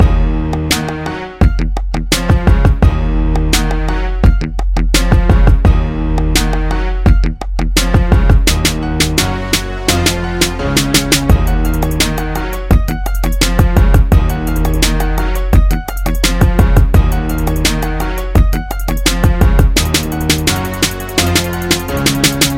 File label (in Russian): muzyka dlya igr